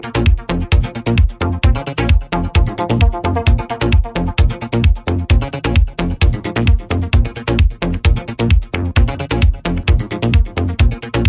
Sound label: ringtone